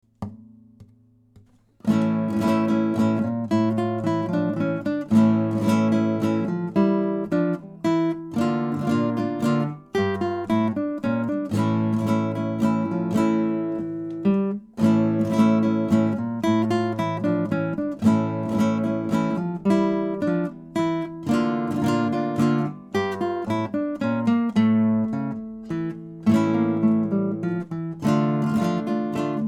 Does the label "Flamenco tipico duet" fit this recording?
Yes